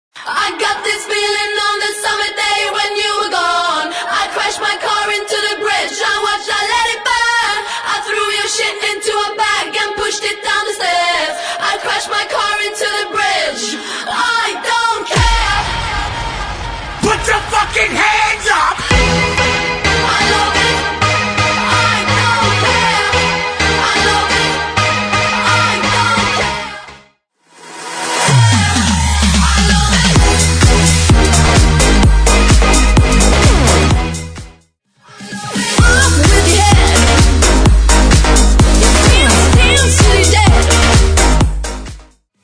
Mashup; Acap In Tone Play

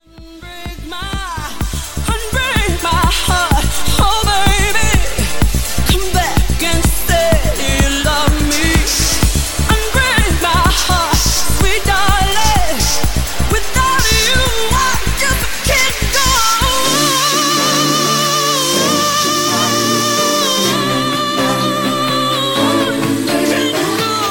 Snippet 126bpm Dm

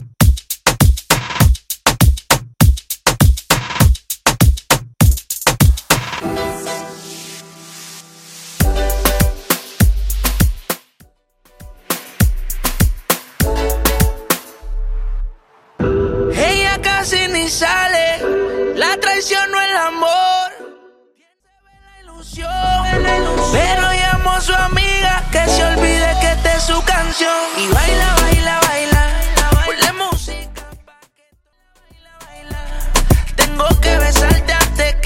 Urbano Latino Moombahton Re Drum 100 Bpm

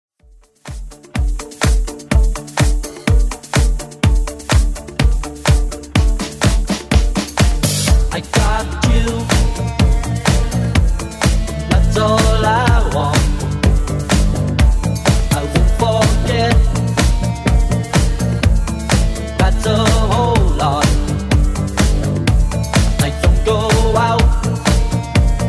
80s Club Edit 125bpm

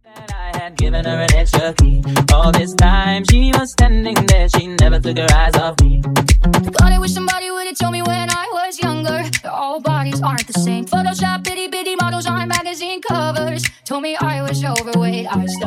Funk Moombahton Transition Dj Intro 120 90Bpm

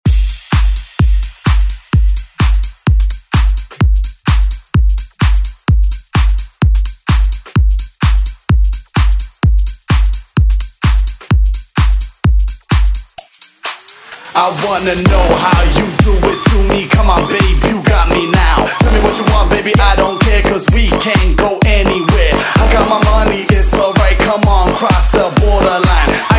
Dance; Redrum

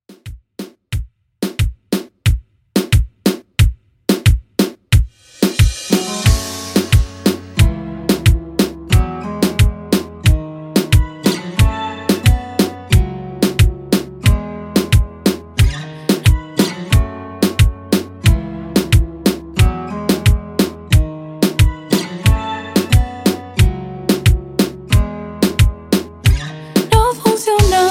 Reggaeton 90Bpm

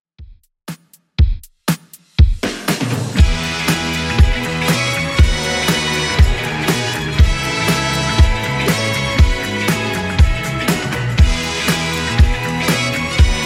60s Redrum 120bpm

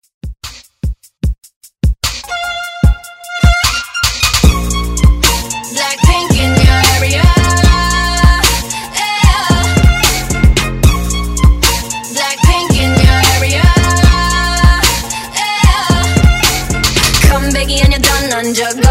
Kpop Redrum

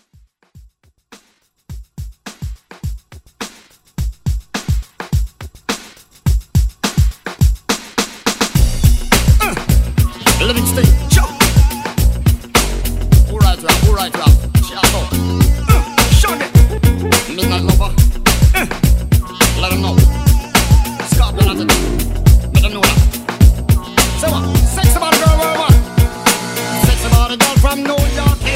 Throwback Redrum

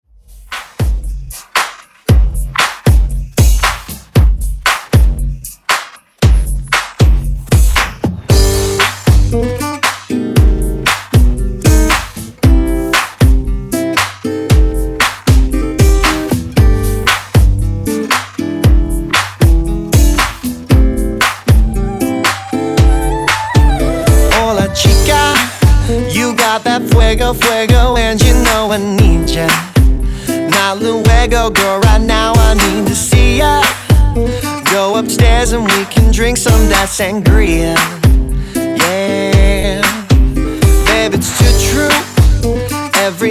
redrum 116Bpm

